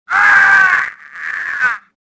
death, worker; worker3

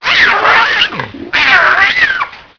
goat shake

